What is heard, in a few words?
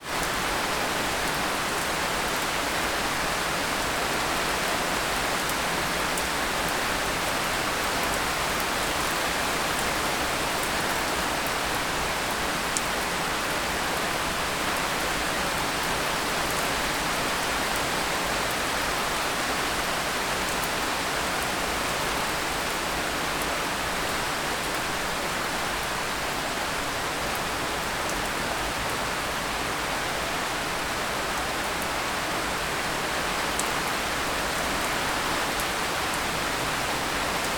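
rain medium heavy with gentle drips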